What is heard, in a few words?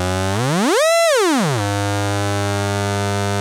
ARP 2600 G3, synthesizer; Hollywood Edge - Giorgio Moroder Rare Synthesizer Collection